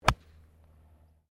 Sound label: GOLF SWING IRON; Various Golf sounds; Sports